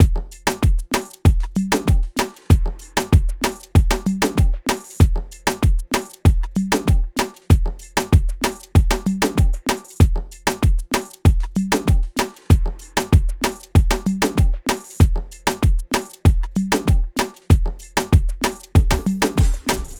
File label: 96 drum loop full; jambo